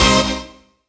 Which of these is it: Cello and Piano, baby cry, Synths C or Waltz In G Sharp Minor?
Synths C